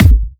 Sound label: Hard Kick; Vengeance Samples